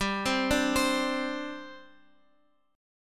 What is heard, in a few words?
G Suspended 4th, Guitar, Open F; Gsus4 arpeggiated; x,x,7,7,5,7